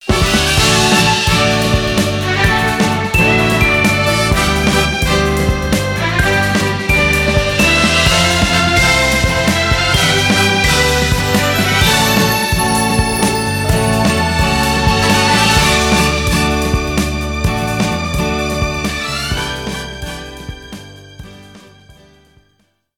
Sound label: Race Win; Gallop Racer 2004; PS2